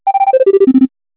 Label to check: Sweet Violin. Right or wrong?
Wrong